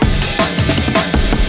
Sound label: hardcore amen, drum loops and breaks, 161 bpm; 161 to 180 bpm